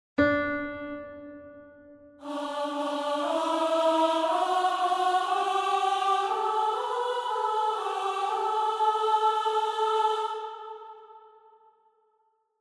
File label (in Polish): Ameen na 3 glosy Glos 2